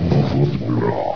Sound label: baby panther idle; svencoop; custom monsters